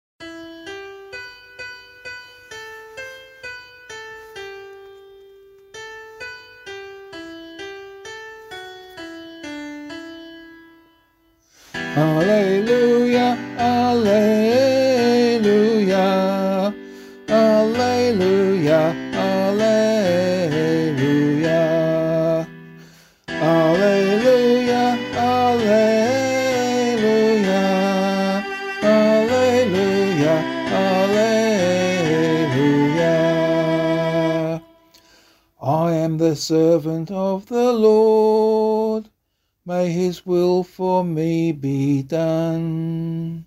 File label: vocal, Gospel Acclamation; 4th Sunday of Advent: Gospel Acclamation, Years B and C; Gospel Acclamation for Australian Catholic liturgy.; LiturgyShare